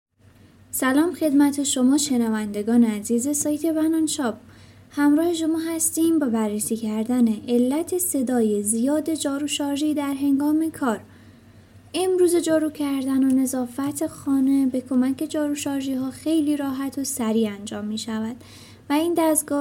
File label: rechargeable vacuum cleaner noise